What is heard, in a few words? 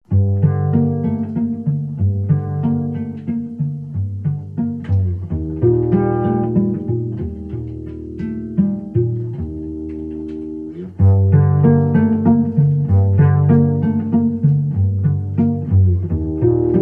Serene Cello